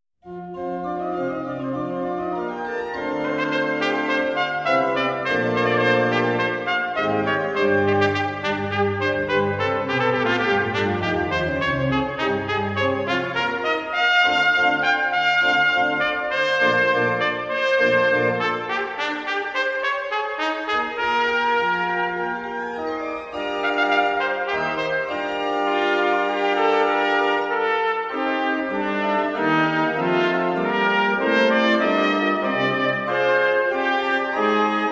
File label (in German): trompete u orgel; karlson